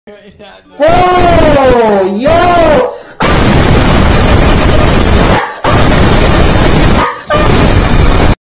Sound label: yelling guy loud